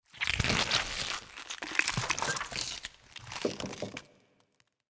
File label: hatch, alien